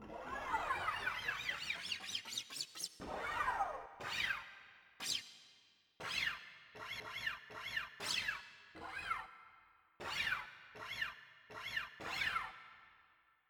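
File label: Guitar Fret Noise; FF7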